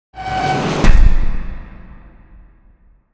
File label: Door Open Fast; scpcb-godot